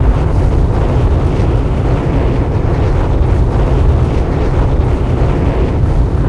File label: explosive objects; World of Tanks